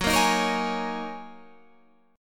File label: Gb 6th Add 9th Gb down Guitar Standard; strummed; Gb6add9; JGuitar